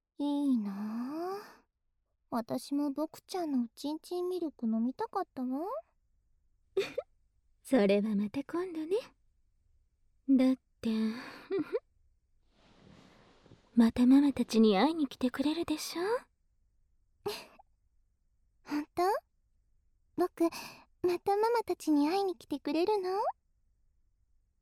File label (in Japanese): 反転 ピロートーク耳舐め, ASMR